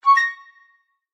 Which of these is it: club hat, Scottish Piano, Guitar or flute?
flute